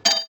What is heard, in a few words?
Sound Phone Memo